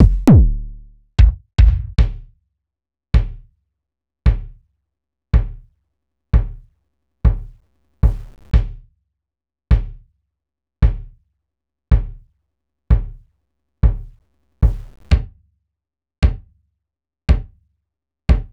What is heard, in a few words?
Roland BK-5 Kicks